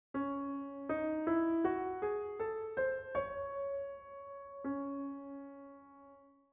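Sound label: C sharp minor scale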